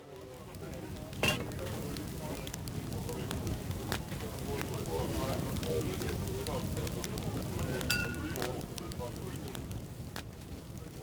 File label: Crowd Ambience; Pitch Black Audio Pong